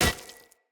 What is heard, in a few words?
Short Sword Medium Impact Rock